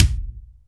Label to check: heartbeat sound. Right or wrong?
Wrong